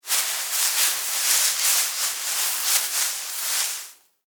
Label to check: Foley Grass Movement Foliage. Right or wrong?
Right